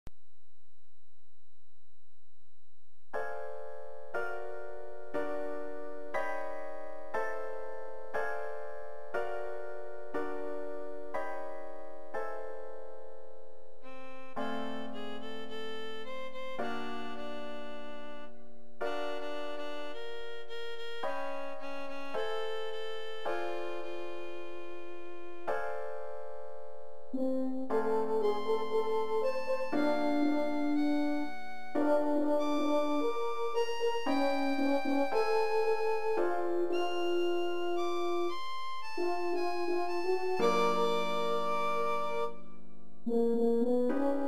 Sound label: horn trio forest opening